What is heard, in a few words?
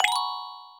hearts Combine; HeavenStudio; love Lab